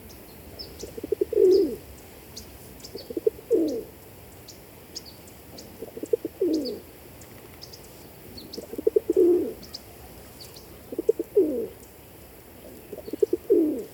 野鴿

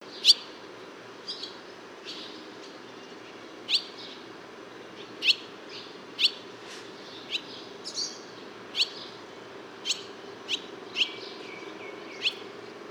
家燕 Hirundo rustica

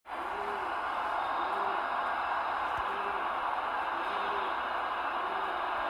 鴿子

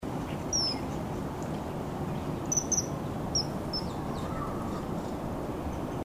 普通翠鳥 Alcedo atthis bengalensis

